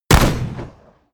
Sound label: revolver close shot